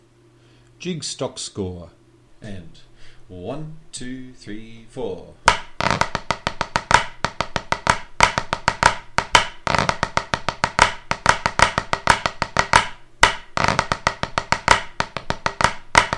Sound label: Jig Stock Score